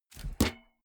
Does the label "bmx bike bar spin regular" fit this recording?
Yes